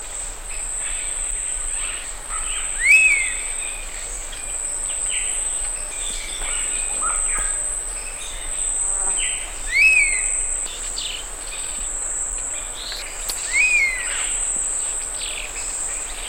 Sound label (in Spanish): Águila negra Spizaetus tyrannus